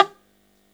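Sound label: EL BZ PIZZ G, bouzouki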